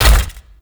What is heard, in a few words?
Melee Jump; Warframe; Necramech